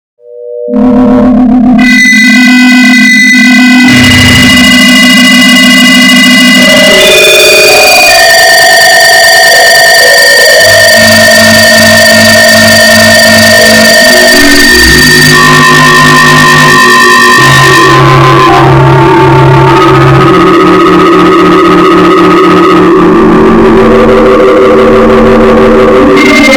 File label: noise